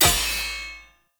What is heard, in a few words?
Short Sword Medium Impact Metal Shield; Weapon Impacts; Player; Swords; Combat